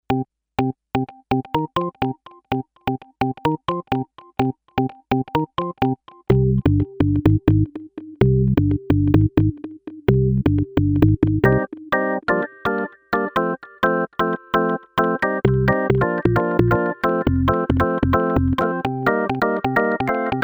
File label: House